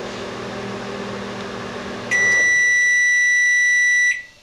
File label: microwave